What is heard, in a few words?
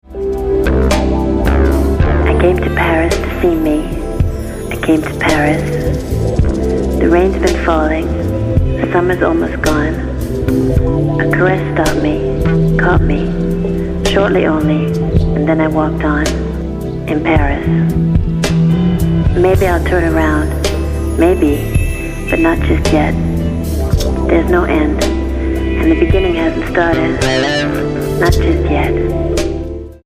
TranceGroove